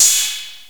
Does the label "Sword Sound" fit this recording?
No